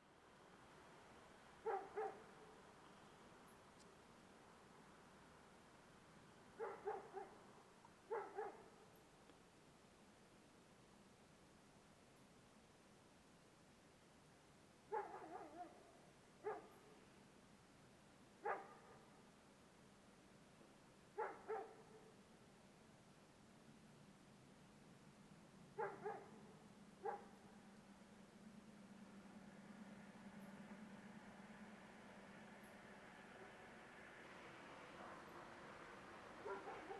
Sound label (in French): chien nuit